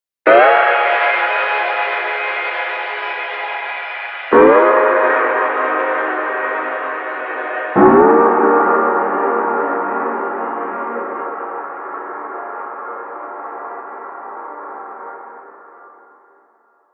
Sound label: Glass chord